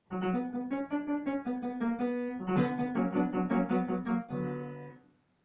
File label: Brahms First Piano Trio scherzo